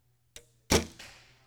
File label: archery foley release and impact; SoundsCrate